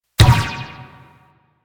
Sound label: Ball Bounce Dirt; Missions; Tenno Ball